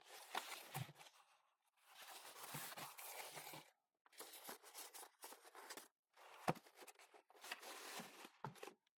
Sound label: Egg, Carton, Open, Take Egg, Close, Set Aside; UberDuo; The Kitchen Audio Play Set